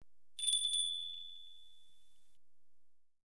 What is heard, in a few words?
Audience Applause